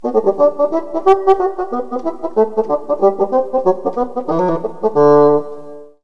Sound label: Bassoon